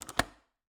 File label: Cabin, window, latch, flip, lock, close, hyper; Eiravaein Works; Vaeyan IV